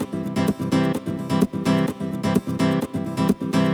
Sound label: Nylon Guitar C maj, 128BPM; Vengeance Samples; VEH3 Nylon Guitar Kit 2